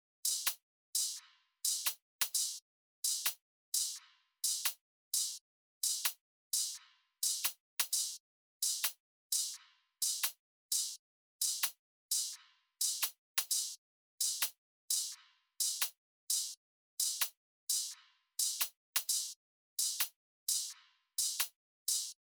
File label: Drum Loop 86 BPM Open Hat; Loop Stems; Cymatics; DOPE DRUMS VOL. 1; Bunny Drum Loop